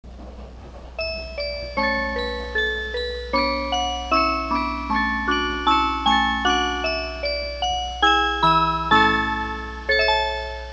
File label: chime